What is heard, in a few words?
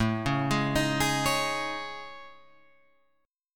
A Major 7th Flat 5th, down Guitar Open F; AM7b5 arpeggiated; JGuitar